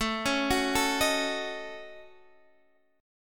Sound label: A 6th Flat 5th, Guitar Standard, chord; A6b5; arpeggiated; JGuitar